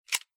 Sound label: Unlock; Doov